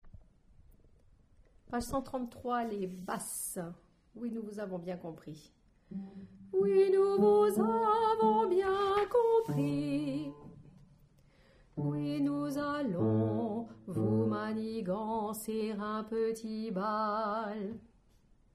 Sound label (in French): Basse